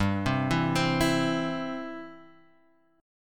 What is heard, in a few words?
G 6th Suspended 4th; G6sus4 arpeggiated